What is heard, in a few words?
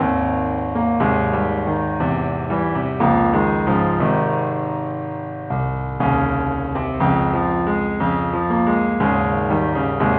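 keyboard Only key C sharp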